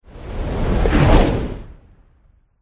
crab buff

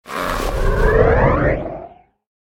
dikobraz middle buff

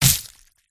boss attack contact up; krofdor

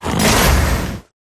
Rat

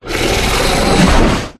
attack up; uborg

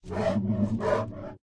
Bear Stun

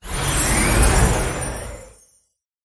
cat death

